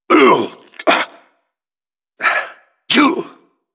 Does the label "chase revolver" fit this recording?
Yes